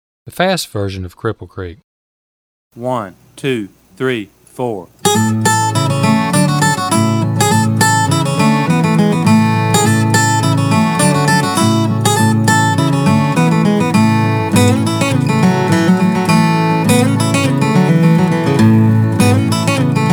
Cripple Creek Fast

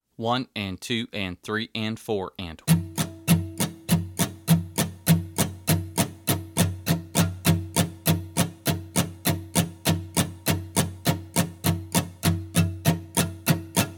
Muted Strumming Drill

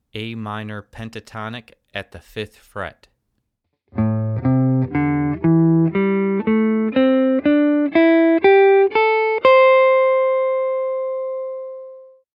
A Minor Pentatonic

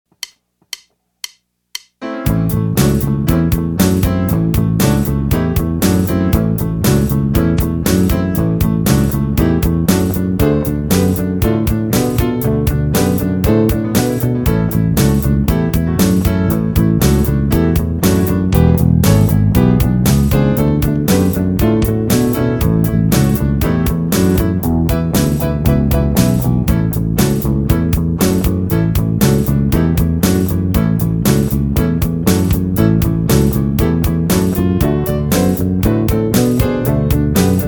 Walking Eighths In A Fast

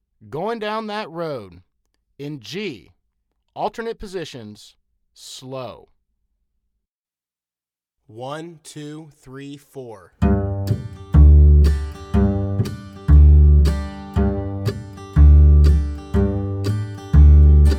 Goin Down Road Alternate

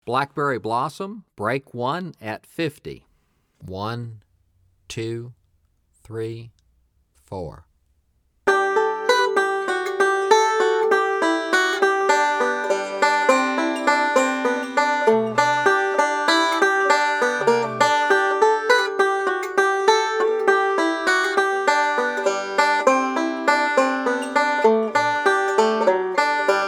Blackberry Blossum Break 1, 50 Bpm

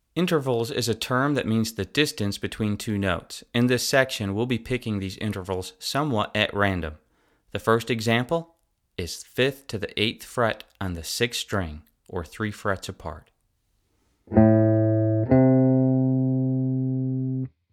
Intervals

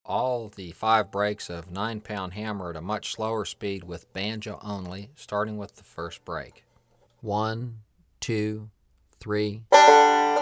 Hammer Break 2 @ 64 Bpm

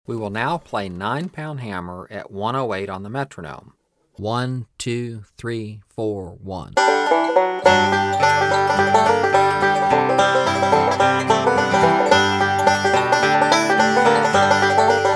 Hammer Breaks @ 108 Bpm

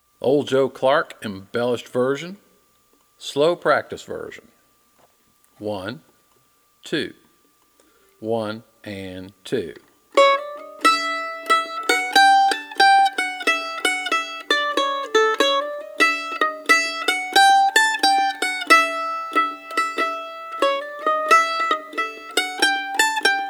Embellished Practice Version; Old Joe Clark

